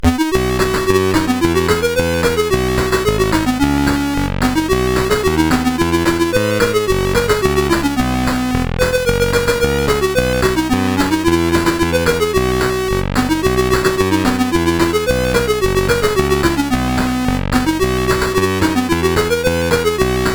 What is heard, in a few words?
sid CSG8580R5, GAMES; Aussie Games